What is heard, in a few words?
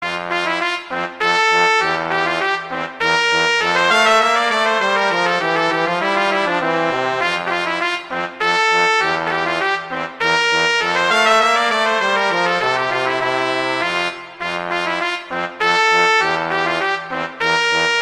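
Duet, horn; Mozart; Duets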